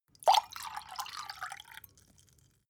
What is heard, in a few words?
glass pour soft; soundpool